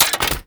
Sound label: Spear Throw Mech; Warframe; Corpus; Spear Gun